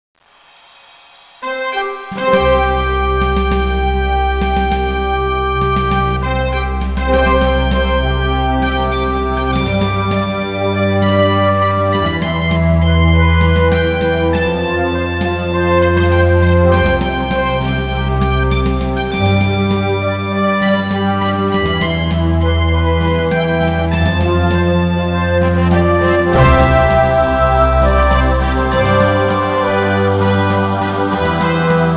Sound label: VICTORY